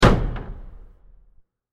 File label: Wood Hit With Reverb; Blasts; Impacts; Crafted Signals